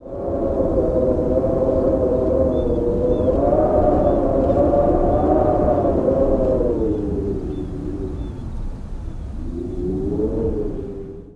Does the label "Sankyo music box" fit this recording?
No